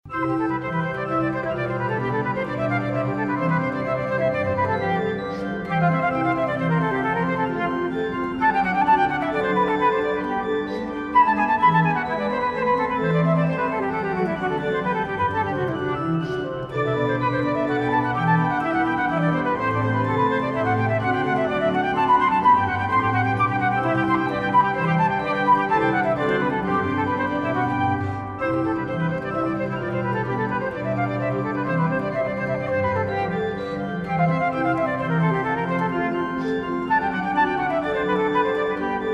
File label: Sonata for Flute and Organ in C major; Bach